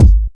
raw bass drum single hit g sharp key, kick drum sample tuned to the G# note